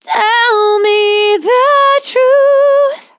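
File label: Tell Me the Truth C# minor 132 BPM, Vocals Phrases; GhostHack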